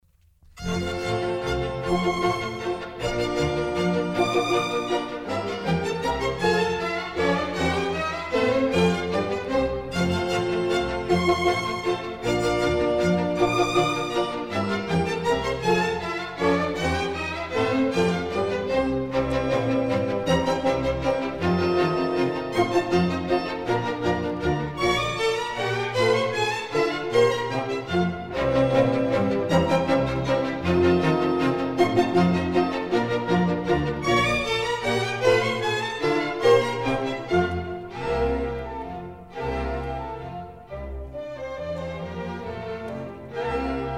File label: German Dances, F Major; Mozart; Dances and Marches